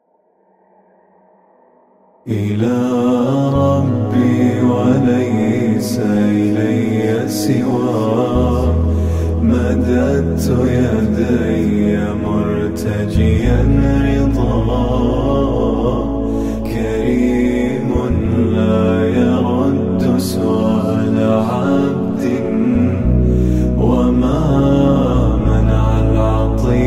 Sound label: Vocals Only, nasheeds